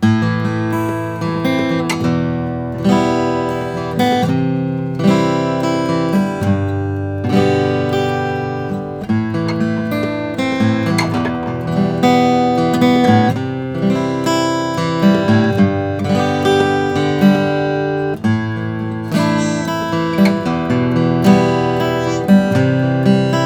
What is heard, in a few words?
collings om2h natural